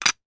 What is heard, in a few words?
game letter tile put in plastic rack; scrabble